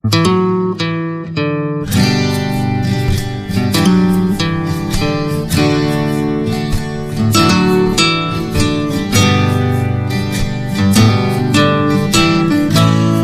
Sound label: Guitars and Percussion